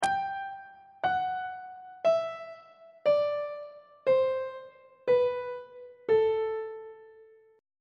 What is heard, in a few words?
a dorian mode descending; treble clef